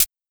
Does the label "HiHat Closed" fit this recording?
Yes